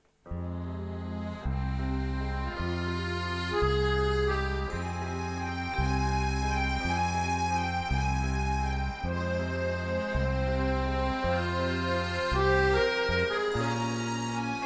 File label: Waltz